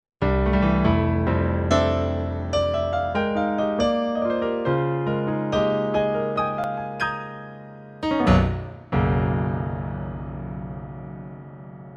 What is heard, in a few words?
Piano